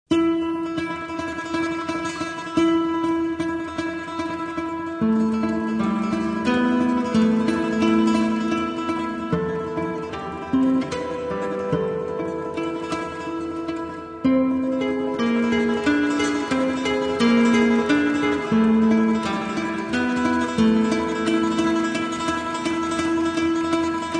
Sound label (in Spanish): campanas